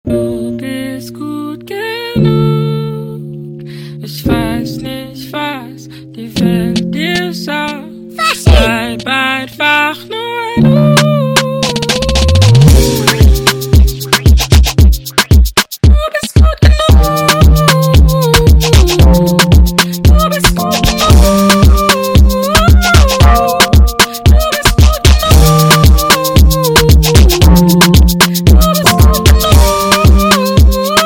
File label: Brega Funk speed Up